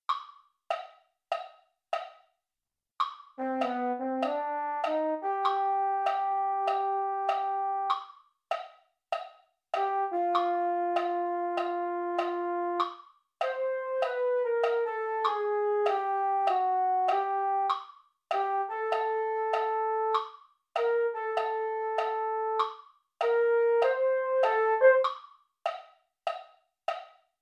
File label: mellophone, MIDI; mini corps